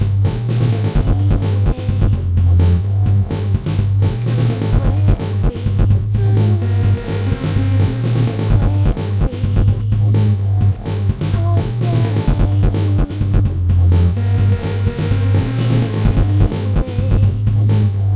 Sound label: harmonic